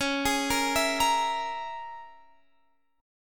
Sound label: C sharp Minor 6th, Guitar Open F; arpeggiated; C#m6